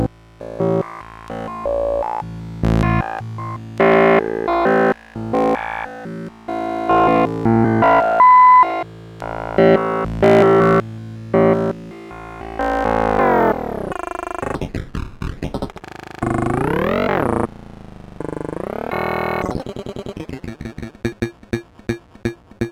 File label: guitar sample looper